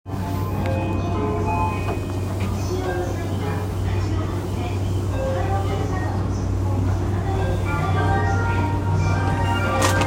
JR 山手線 有楽町 CW, train sounds

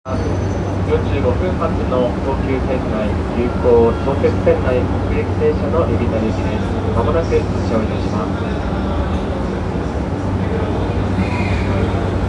南北線白金高輪南, train sounds

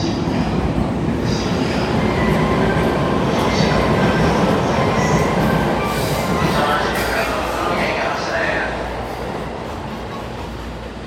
JR湘南新宿線渋谷北

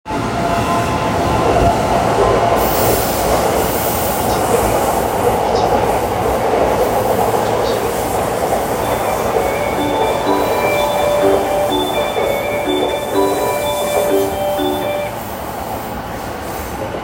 JR中央・総武線市ヶ谷東, train sounds

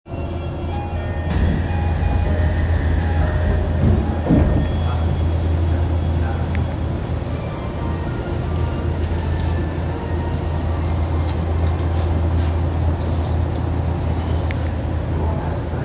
東西線西葛西西, train sounds

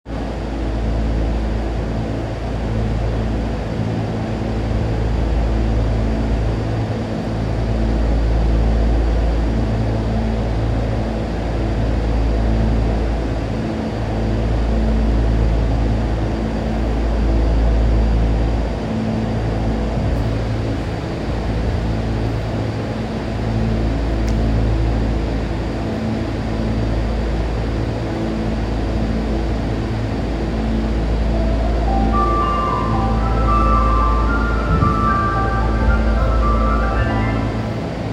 丸ノ内線方南町東, train sounds

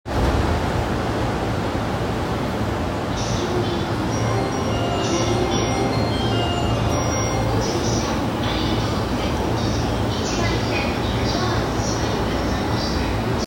JR中央・総武線西荻窪西, train sounds

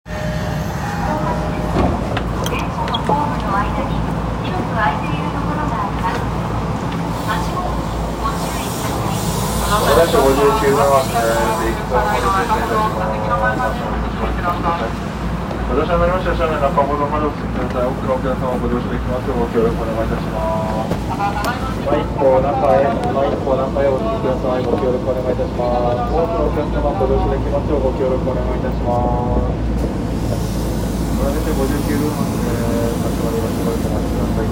銀座線日本橋西, train sounds

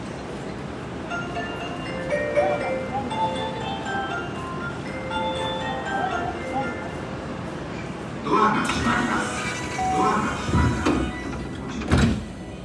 京橋発車, train sounds

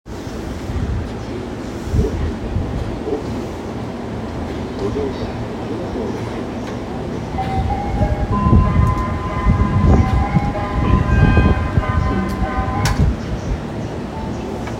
宇都宮線 尾久 北, train sounds

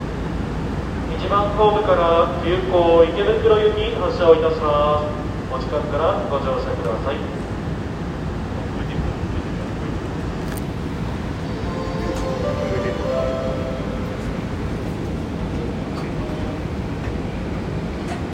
池袋線飯能駅東, train sounds; 西武; 西武池袋線飯能駅東

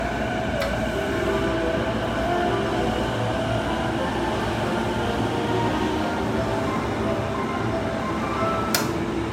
京浜東北線新子安北, train sounds

